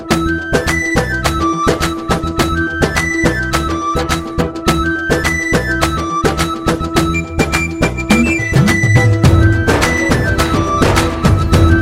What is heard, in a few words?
Flute BG Telugu Ringtone